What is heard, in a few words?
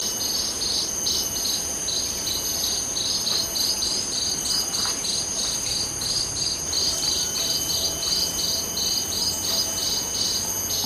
White Rumped Munia juveniles calling; Amar